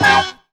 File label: BUBBLE HIT; Zero-G; Funky Elements